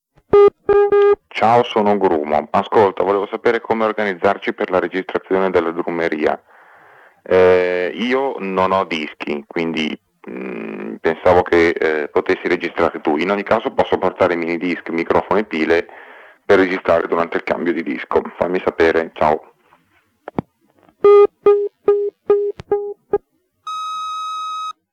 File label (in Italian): drummeria